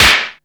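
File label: WHIP NOISE; SFX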